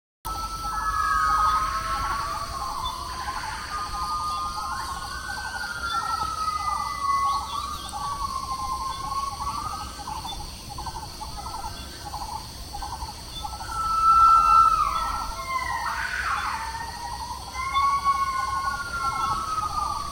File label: south vietnamese forest, soundscapes